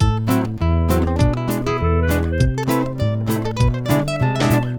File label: jazz (Big Easy), ringtones; Google; NewWave Labs